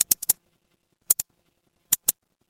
office mouse click

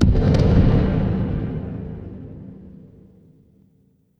magic scifi portal

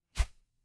paper fabric cloth whip

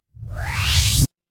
transition sweep

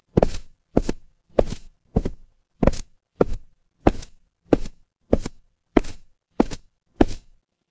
footsteps concrete

